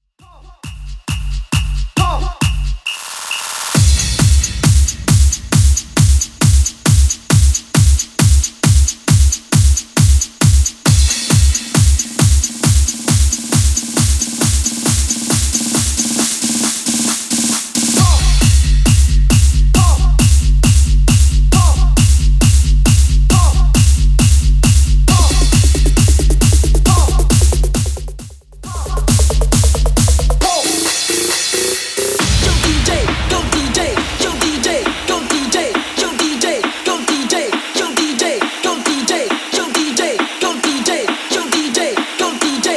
90s 135bpm; Xtend Edit

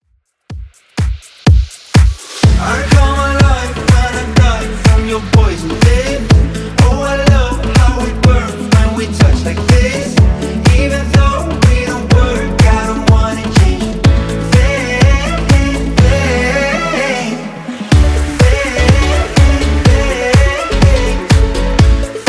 Top40 Clubmix 124bpm

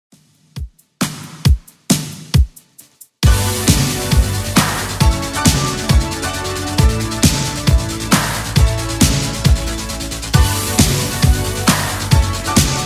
80s Redrum 135bpm

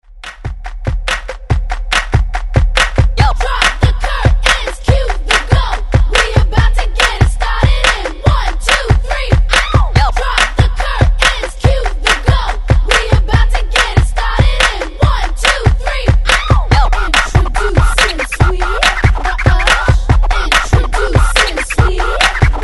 Hype 142Bpm; DJDOLLS; Redrum

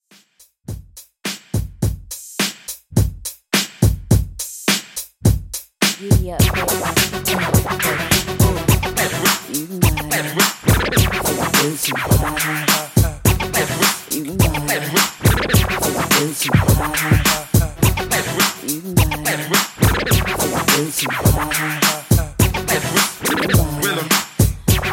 90s Old School Redrum 105Bpm

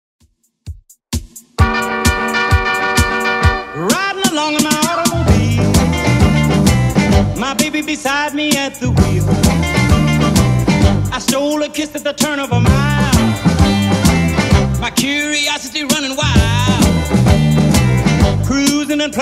60s DJ Intro Outro Redrum 130bpm

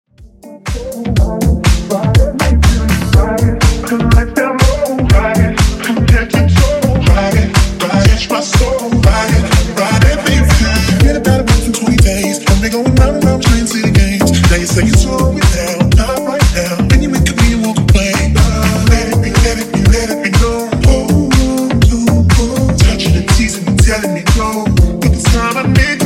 Funk Vocal 122Bpm

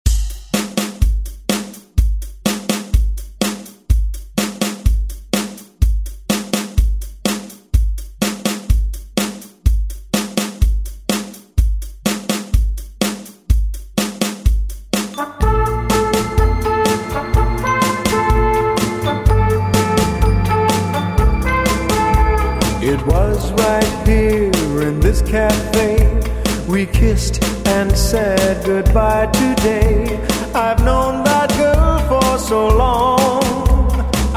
60s Re Drum

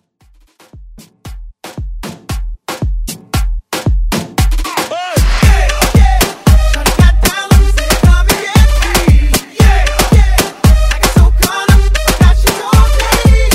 Moombah Redrum

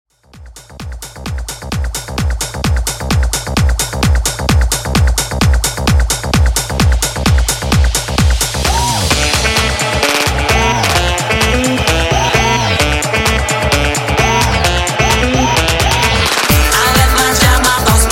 Epic Mashup 130 Bpm

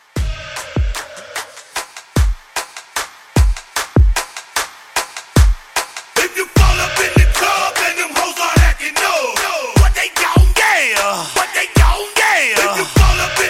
Anthem Hype 75

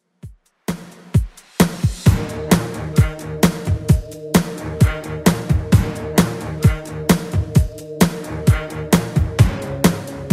80s Redrum 131bpm; remixplanet

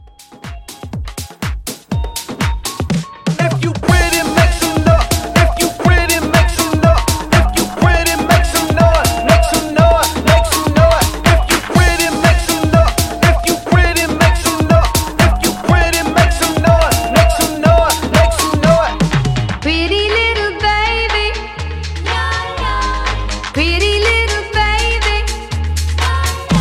Classic Hype 122; Classic Hype Edit

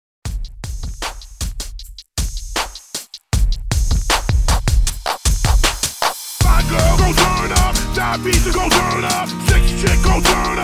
rock hype redrum 78 bpm

